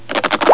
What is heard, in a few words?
squeeky sput; ericschwartz; qualitytime